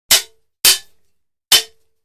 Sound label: Swords; Metal Swords Hit. Three Times.